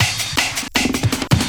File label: LOOP L, Drum & Bass 160 BPM